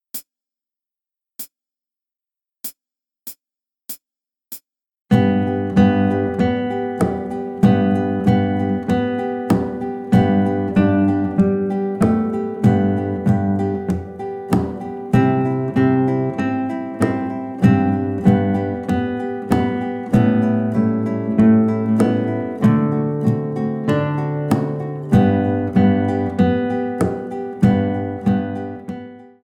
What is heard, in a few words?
Jingle Bells, Guitar; Play Guitar Together 1